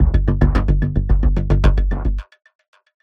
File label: Transition; Everspace 2